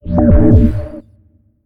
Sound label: ForceField Stop; We Are the Dwarves